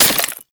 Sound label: Melee Impact Glass; Warframe; Weapons